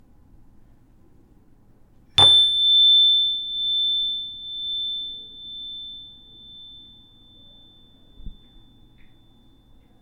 벨

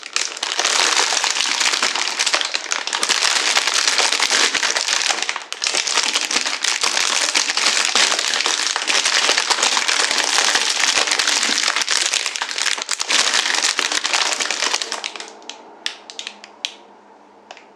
쏟아짐; 검은산

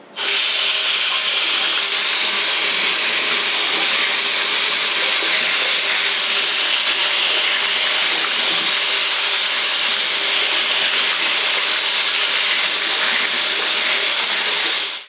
공포 ON

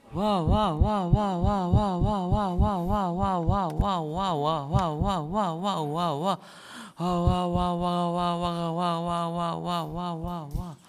사이렌 소리

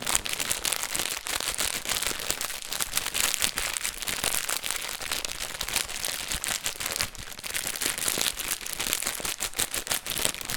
"이으이"

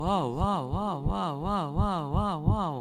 사이렌 소리

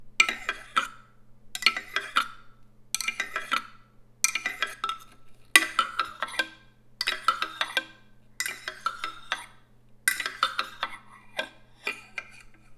따그륵

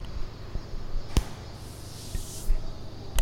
쓸리는 소리

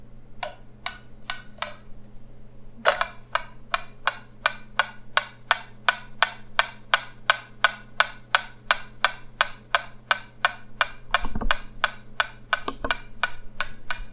메트로놈

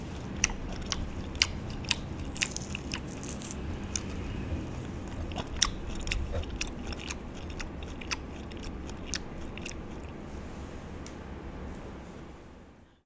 과즙팡팡 오렌지먹는소리

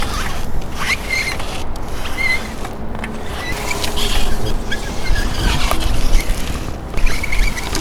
바닥에 끄는 소리; 김환

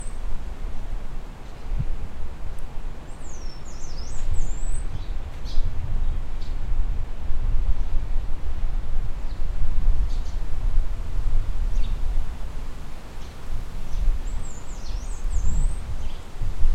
바람과새소리

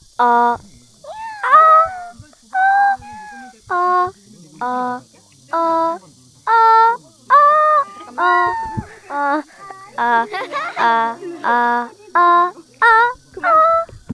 아아아아

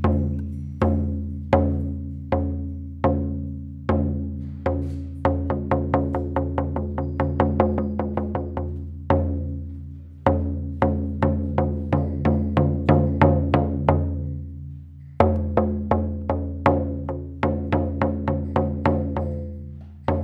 북소리; 은혜

